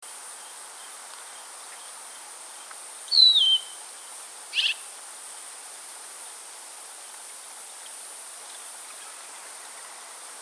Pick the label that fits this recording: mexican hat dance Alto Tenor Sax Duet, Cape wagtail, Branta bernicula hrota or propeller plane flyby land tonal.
Cape wagtail